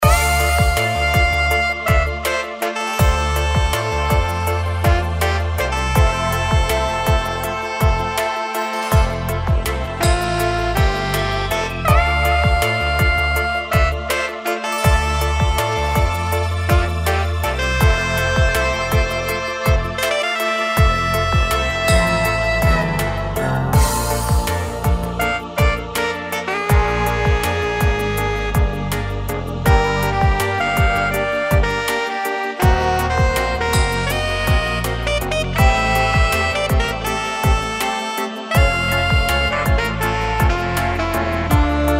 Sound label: Alt Sax Pop